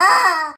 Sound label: rubber chicken; Items; Toys